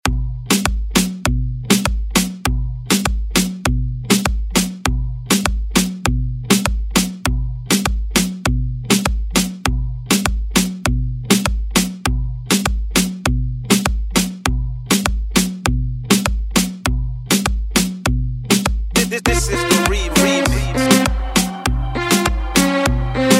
moombahton redrum

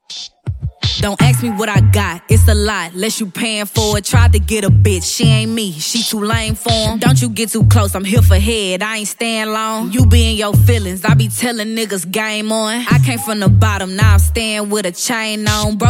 Dj Intro Outro Dirty 82Bpm

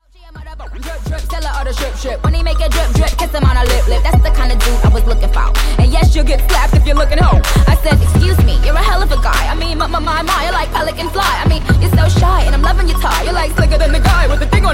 WordPlay Segue 127Bpm Dirty

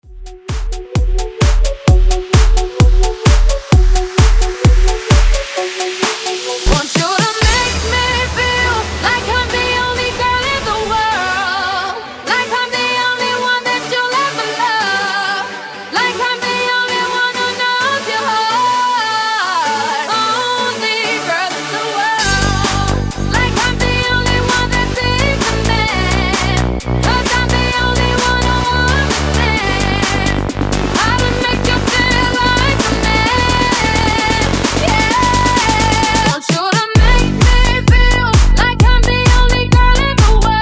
bass house breaker 130 bpm

